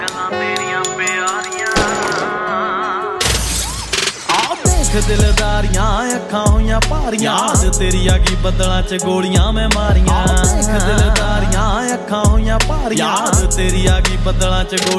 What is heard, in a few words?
punjabi ringtone